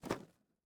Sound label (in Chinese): 猫躲起来